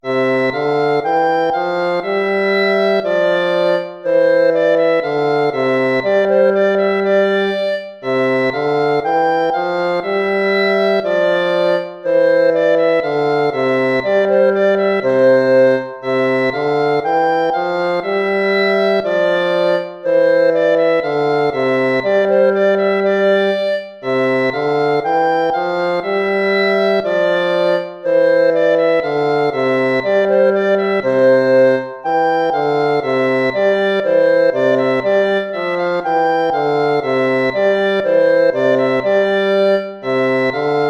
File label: gavotta; hook james